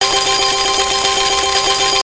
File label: collect, slot; slot game; Hot 7